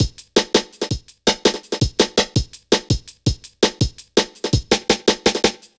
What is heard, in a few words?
Drum Loops, Jungle